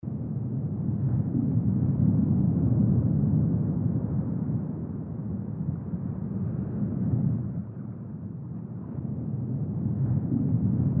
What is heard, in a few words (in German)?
Unterwasser Rauschen; h2o - AUDIO ist speziell für Unterwasser Video Filmer gedacht